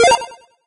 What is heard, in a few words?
8bit reload